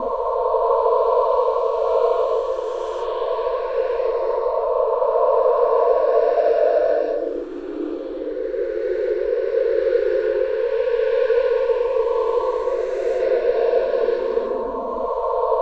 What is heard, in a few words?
OPERA WAVE, Human; Distored Reality